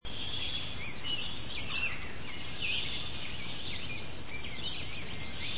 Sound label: Tennessee Warbler